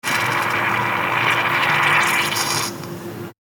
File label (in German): Espressobar Wasserdampf